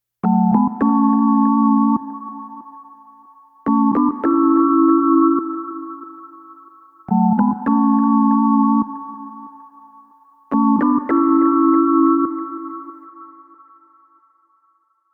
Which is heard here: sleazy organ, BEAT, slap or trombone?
sleazy organ